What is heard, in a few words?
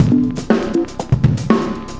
cooling 120 bpm, loops